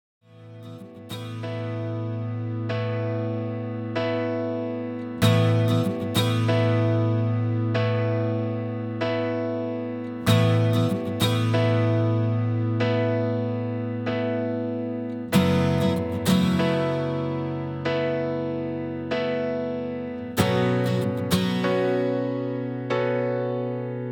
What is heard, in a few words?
Instrumental Version; Kara no Shojo